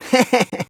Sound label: hehehe